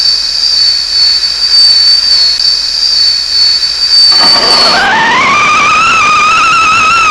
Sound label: steam sound